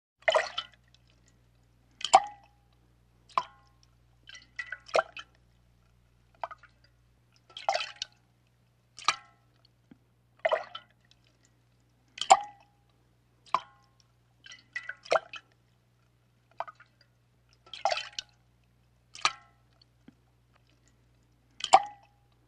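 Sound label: Water Plops Metal Bucket, plop on metal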